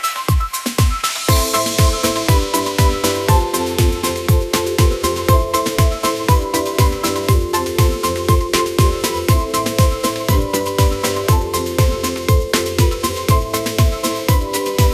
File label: upbeat pop melody